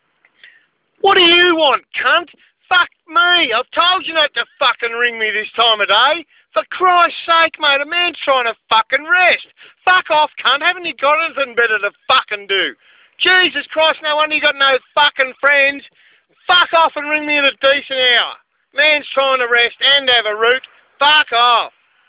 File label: Chopper